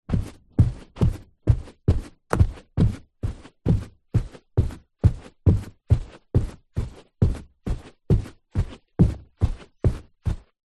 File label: foley footsteps carpet wood floor boots walking; zapsplat